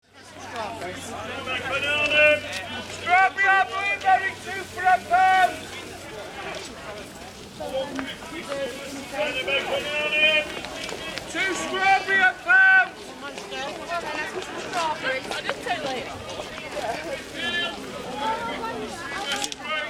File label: Market ambience people talking and vendors yelling